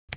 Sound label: connect